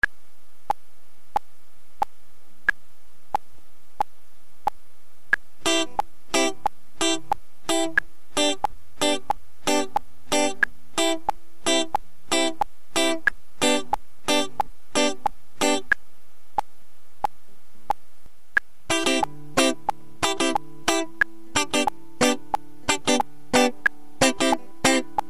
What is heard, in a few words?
The Reggae Groove, guitar